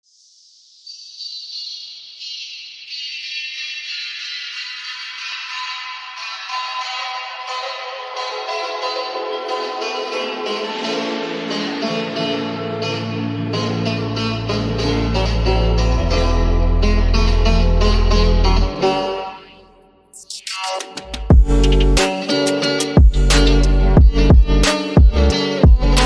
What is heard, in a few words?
saz trap